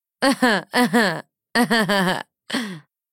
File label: giggle; Whitemane; meloboom